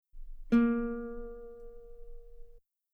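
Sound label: guitar A sharp 2 very long forte normal; bananenkeyboard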